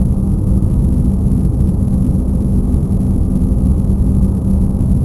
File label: large room, ambience; prototype